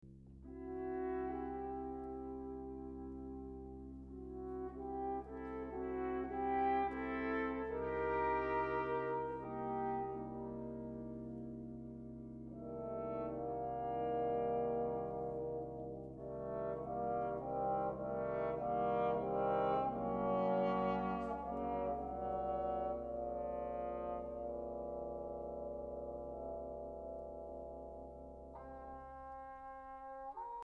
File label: brass